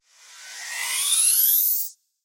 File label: scifi weapon laser long